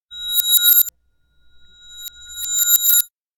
reverse door chime abandoned cafe; anemoi

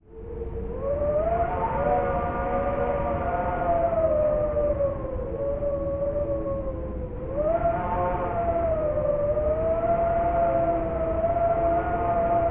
wind; anemoi

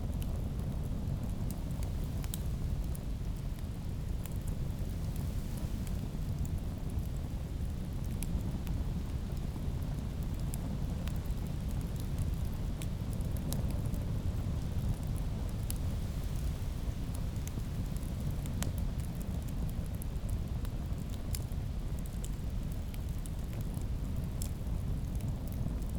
outdoor loghouse front stove; anemoi

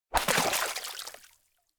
dive water; tsumire; anemoi

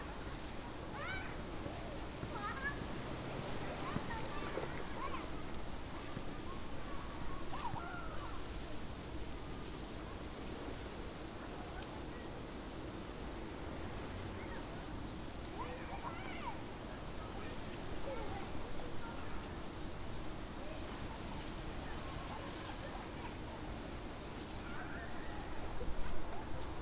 outdoor wheatfield kids chatter

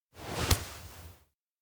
hand on hand; awayuki; anemoi パッケージ版 1.1

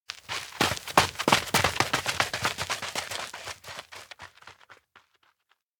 steps outdoor ground run; rikka; anemoi

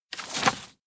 blood oath hold; anemoi

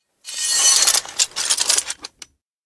sword stab rubble; anemoi

